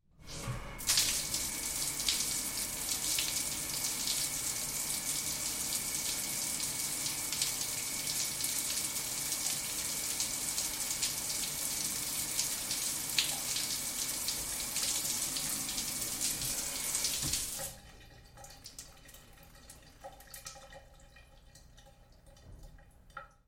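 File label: water pouring from shower head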